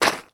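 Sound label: sounds of footsteps on ice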